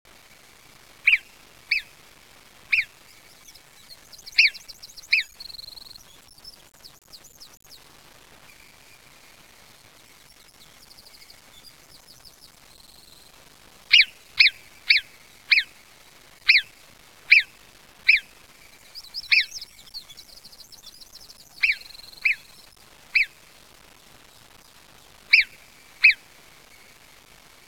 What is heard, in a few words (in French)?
marmotte, sons